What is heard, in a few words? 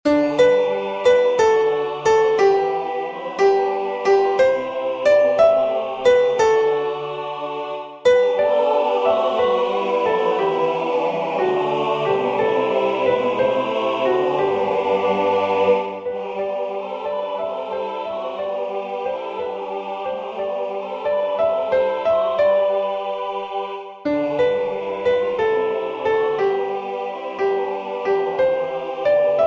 Soprani; Kantuz